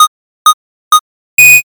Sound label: countdown